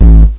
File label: hardcore-dist, bdrum; echoiii